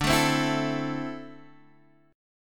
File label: D 7th Suspended 2nd; x,5,7,5,5,5; strummed; JGuitar